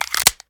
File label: reload xmags mag in; gmod